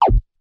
Laser Bolt

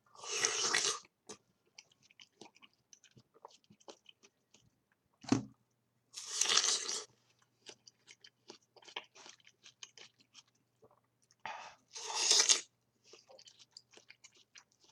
Watermelon Eating

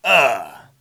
Man Hit Shout

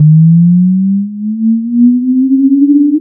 Wierd wawa

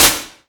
Hi Hat

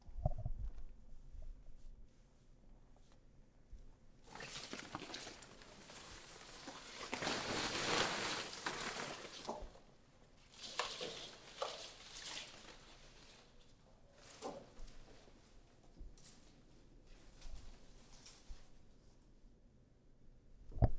Plant Rustling

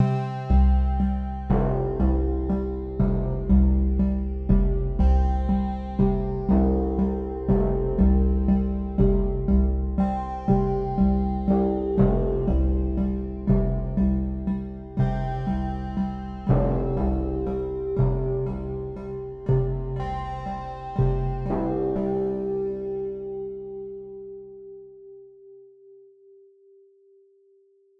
Relaxed Mood

